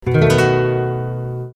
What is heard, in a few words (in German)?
Der Septimen-Akkord H7, H7-Dur h-dis-fis'-a'; Dominant-Septakkord; Harmonielehre